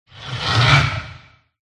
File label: dragon red attack voice bottom